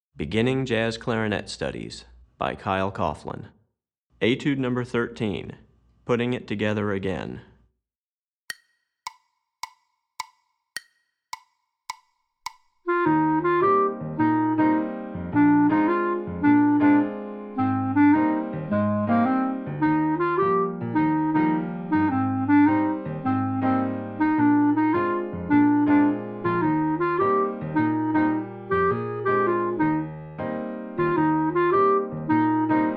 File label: Etude, Jazz Clarinet; Recordings For Beginning Jazz Clarinet Studies, Volume 2; Etude #13